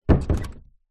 household door close internal wood modern; zapsplat

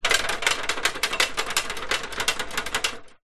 industrial step ladder metal rattle shake

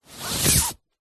foley flag handling movement pull through hand; zapsplat

